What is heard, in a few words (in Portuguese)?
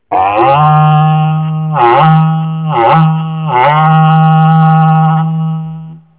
berrante, Rodeios Toque Berrante; Esportes